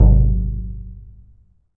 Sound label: Surdo